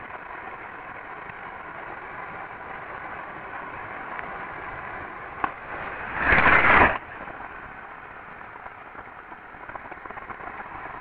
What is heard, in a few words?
good steam